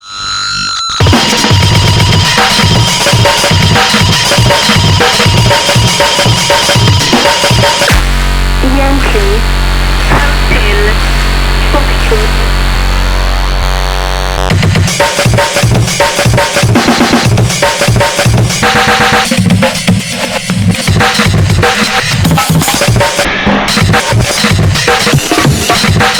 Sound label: Instrumental